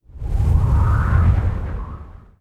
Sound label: Gale Wind Start; Mountains; Journey